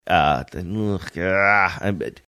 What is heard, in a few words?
making trying to remember sounds